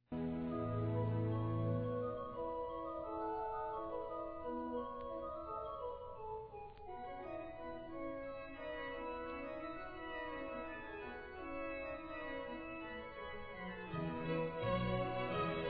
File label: organ concerto; op. 8; CBC Vancouver Chamber Orch., conductor John Avison; Organ, Derek Healey